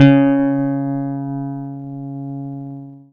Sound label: C#, Synclav FM PLUCKED; Big Fish Audio